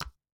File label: Table Enter; miside demo windows gamerip 2023